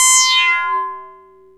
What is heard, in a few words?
Metal celeste, Roland System 100; free techno acid samples